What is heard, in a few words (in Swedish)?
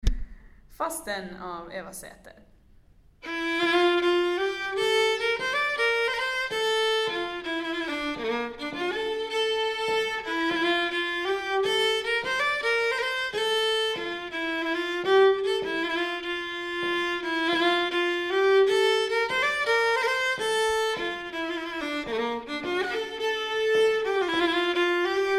melodi; Fastän